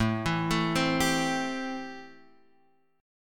A-Minor 7th A Guitar Drop D

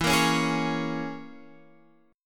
E 6th Flat 5th, Guitar, Half Step Down

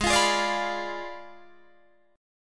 Ab Diminished 7th, down, Guitar, Drop D; strummed; Abdim7 Chord

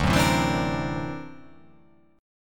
D Augmented 9th down, guitar

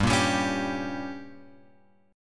G Diminished; Gdim Chord {3 1 X 0 2 3} strummed

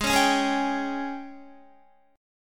G sharp Major 7th Suspended 4th, Guitar; G#M7sus4 Chord

